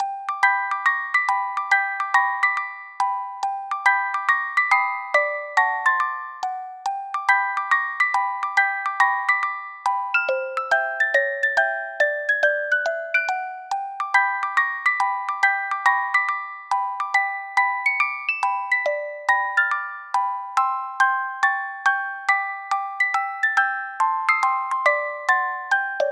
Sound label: Ragtime, Music Box; animal crossing new leaf welcome aamiibo soundtrack